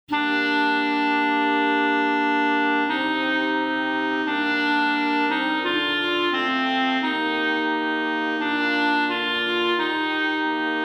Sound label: Evening Service Nunc Alto; Farrant